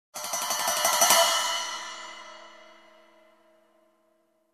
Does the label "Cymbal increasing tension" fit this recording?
Yes